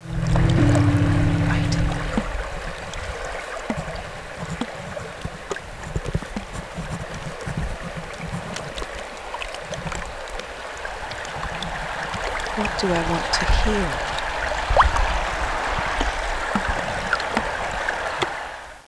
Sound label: short river